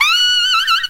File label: horn squeek